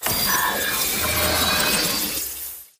Reboot Power Up; AI Stalker; Titanfall 2

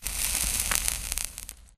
imp bullet energy default sizzle; Titanfall 2

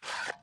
reload mag grab; r101; Titanfall 2

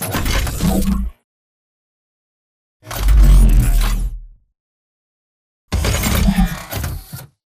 intro point end; Titanfall 2

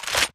wpn krabersniper reload boltback; Titanfall 2

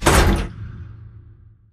sliding door ext verb close stop; Titanfall 2

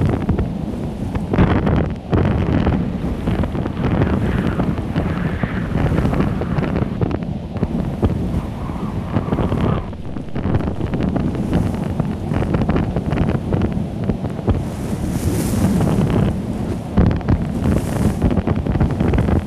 wind buffet; beacon; Titanfall 2

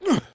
spectre curb stomp grunt; Titanfall 2

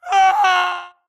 grunt death crush; Titanfall 2

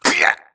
grunt death neck; Titanfall 2

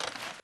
wpn arl reload; Titanfall 2

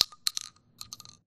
12 gauge shotgun shell drop; Titanfall 2

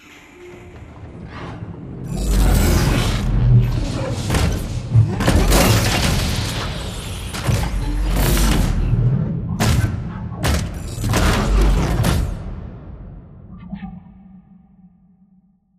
control room pipe reverb; bt; beacon; Titanfall 2

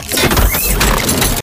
Servo Jog; Titanfall 2

